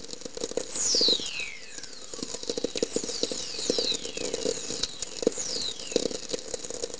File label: whistler